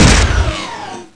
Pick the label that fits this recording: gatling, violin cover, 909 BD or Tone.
gatling